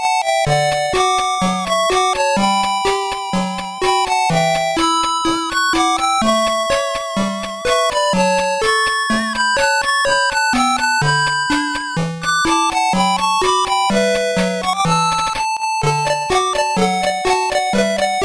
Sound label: Music Ending; Sonic Chaos; SMS